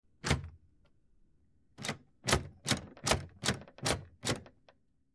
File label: Door Knob Single, rattle door